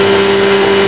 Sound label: engine revving; Stunt GP